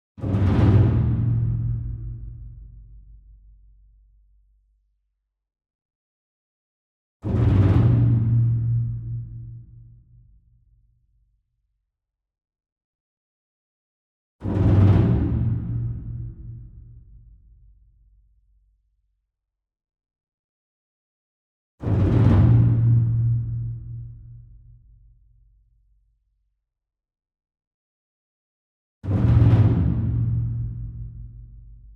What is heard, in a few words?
ENSEMBLE DRUM Taiko Roll Far; boom library; Cinematic Strikes Construction Kit